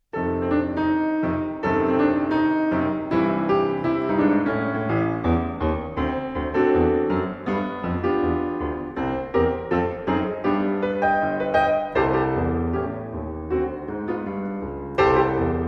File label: Big Band Tune